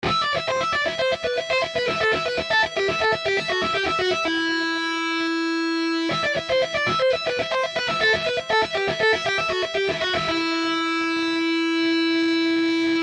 Tapping Lick A

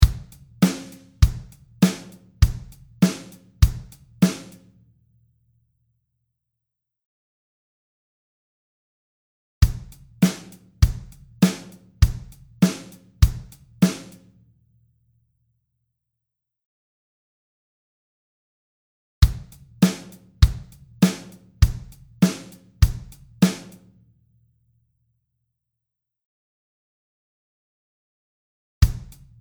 Drum machine 4:4 straight 100 bpm

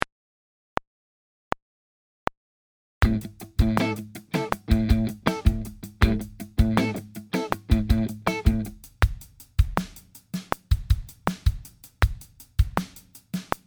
groove

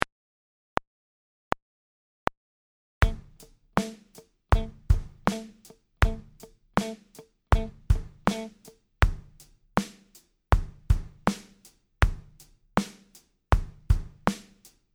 groove

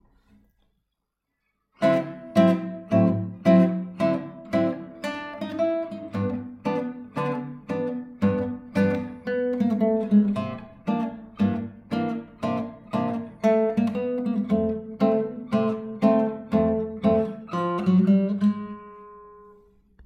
Lick

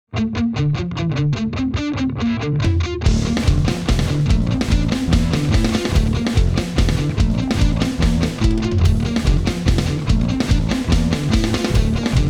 Groove & Solo D Aeolian, guitar

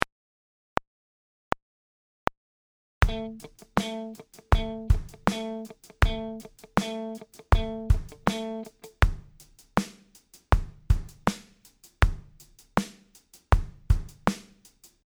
groove

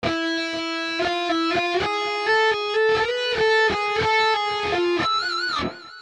Minor Harmonic A

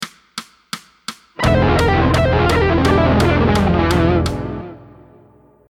Lick

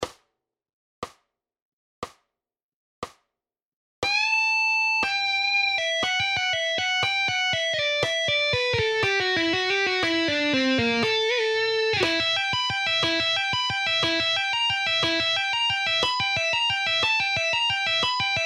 Rock Gtr Solo Bpm 60

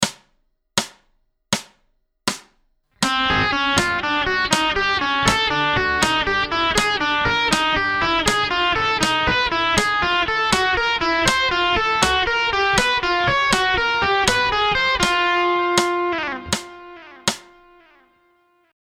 slow 80bpm

